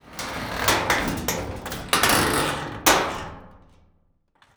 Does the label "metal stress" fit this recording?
Yes